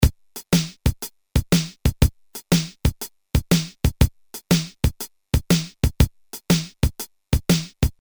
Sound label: Shuffle, Boss DR-220A Preset Patterns